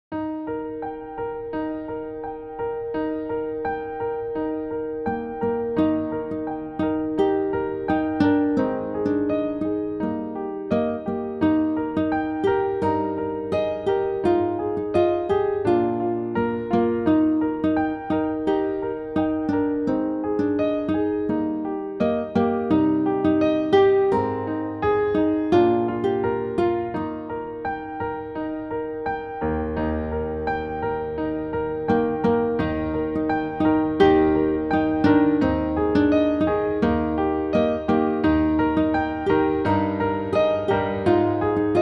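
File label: INSTRUMENTAL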